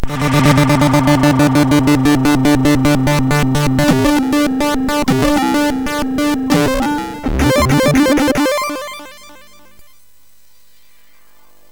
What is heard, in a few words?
sfx, MOS 6581; MOS6581R4; hvsc